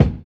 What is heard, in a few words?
LO FI BD, Drums Dance & Groove; USB Soundscan vol.11; AKAI